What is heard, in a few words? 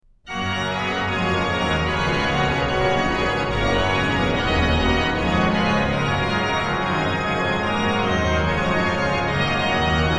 Lobt Gott, ihr Christen, allzugleich, organ; BWV0609; BACH; BACH ORGAN WORKS; CHORALE PRELUDES